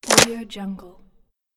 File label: bone fracture; nektar pro